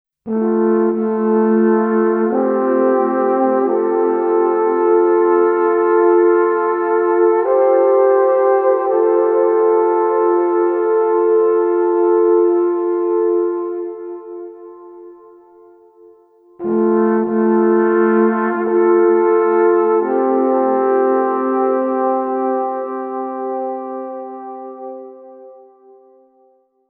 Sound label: Alphorn Duo